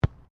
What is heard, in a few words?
SOCCER BALL BOUNCE ASPHALT; Soccer ball sounds; Sports